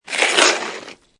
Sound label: Snow And Ice Ice Slide Pass By; Weather